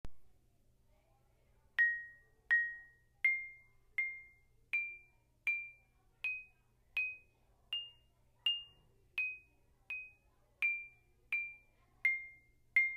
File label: percussion